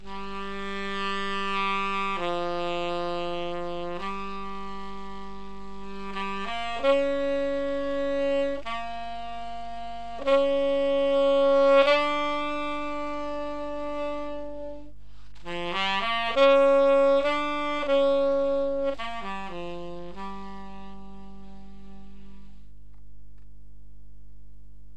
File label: sax